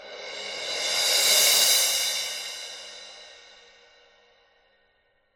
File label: Cymbal